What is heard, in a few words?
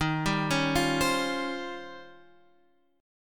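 E flat 13th E flat, down, Guitar, Open F; Eb13 arpeggiated; JGuitar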